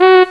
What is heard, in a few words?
trombone; Sound Effects for Multimedia - Volume 1; horn instruments